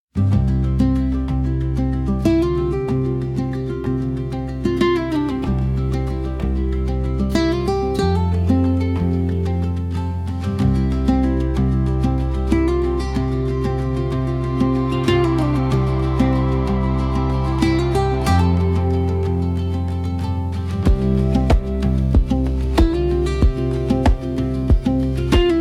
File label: romantic acoustic folk glitchy sfx 95bpm; for podcast